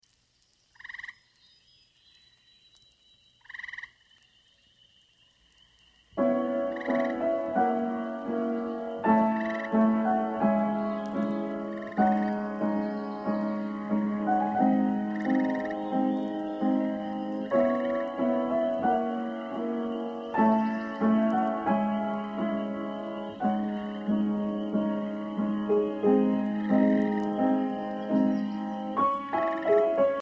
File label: pieces for piano